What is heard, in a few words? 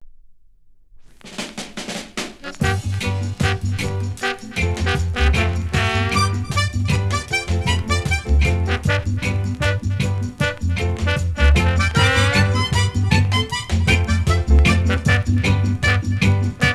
reggae monica